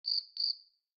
Bird Cicada Notification Evening; Xiaomi Redmi-8A

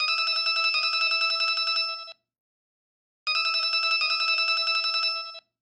Ring Alarm; Gionee ELife-E7

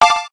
digital zero

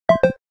Rain Drop, notifications; Motorola RAZR-50-Ultra-XT2451-4 China

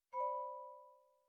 Charging Started; Itel S25 Ultra

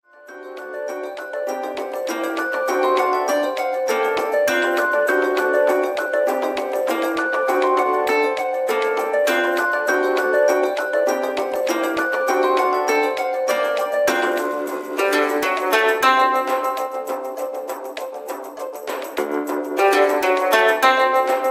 Alarm Bold, BlackBerry; BlackBerry 9000-Bold

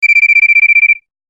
Cricket Beep; LG UX300

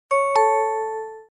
Message Ding Dong; LG T300 Cookie Lite

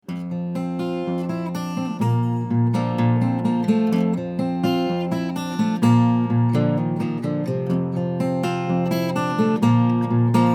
Ballad guitar, alarms; MyPhone Agua-Rio

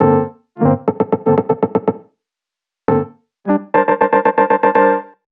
plot, ringtones; Nothing Phone (2)

